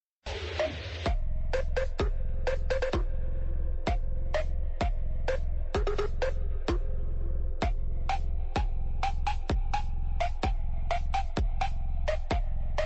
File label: Deep house